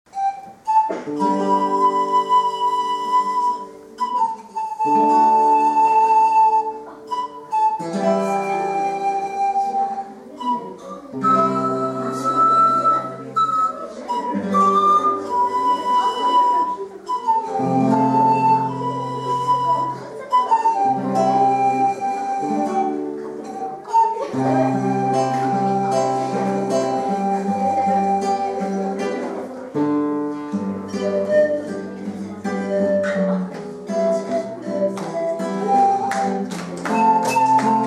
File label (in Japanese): パンフルート; 同じくパンフルートで児童の知っている曲を演奏しました。; 小学校・音楽鑑賞コンサート; 貝塚市葛城小学校